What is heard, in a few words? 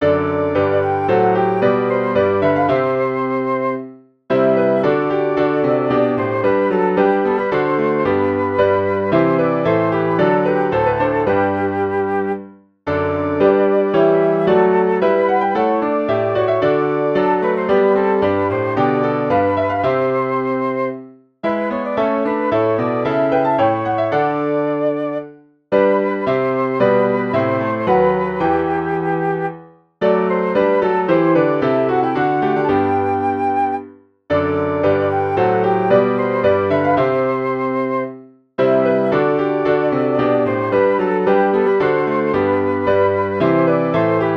chorale for flute piano; bach johann sebastian; alsdenn so wirst du mich